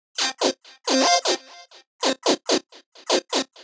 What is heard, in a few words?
130 C, uk garage, Synths; 130bpm Lines n Loops